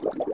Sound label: water; quake; american